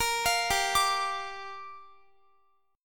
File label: G Minor 7th, Guitar; Gm7; arpeggiated